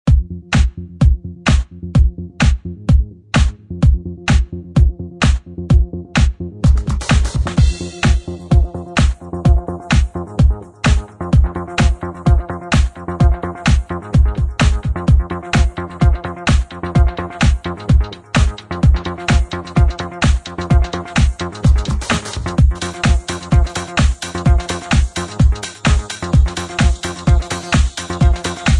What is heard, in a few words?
contemporary urban pop